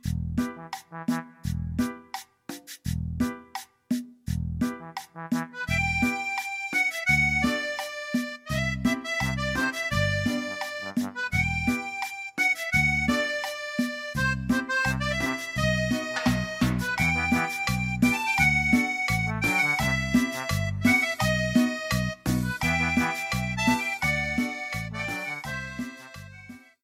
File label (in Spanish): Cumbia